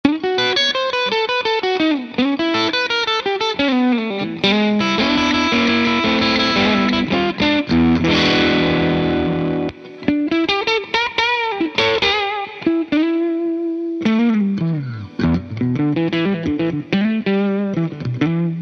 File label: iPad GarageBand iRig using crunchy blues amp preset